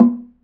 hi log drum; Africa

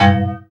FX Dance

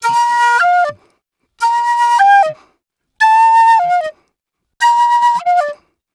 Penny 075bpm Bb, Penny Whistle, African; Quazibeat; African Secret

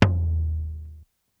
Tom Deep; Lost Tapes From Mars

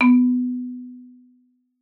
MAR B3, MARIMBA; Xsample VOL-4

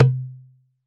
Tabla Dom; Roland BK-5; Percussion

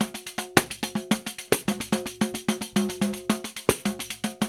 RIO, Rio Batucada; Spectrasonics; Supreme Beats; World Dance

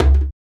SUNU, African Perc; Spectrasonics; Supreme Beats; African Contemporary; Afro DRMs

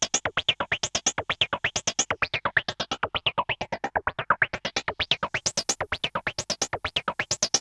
EMS LOOP; ELEC-LOOPS; Syntec; Wall of Sounds; TECHNO-BOX

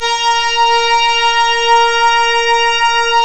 ORGAN, A#; Big Fish Audio; Synclav FM

